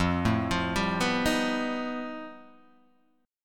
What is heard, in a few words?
F 9th Flat 5th F down Guitar Open G